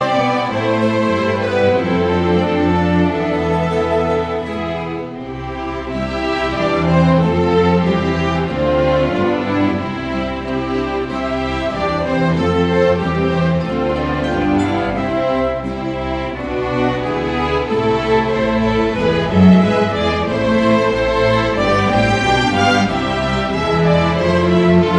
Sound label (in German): Instrumental